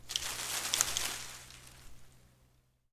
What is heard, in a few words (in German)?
Blatt